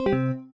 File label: Cancel; blastmorph pinball; xbox series xs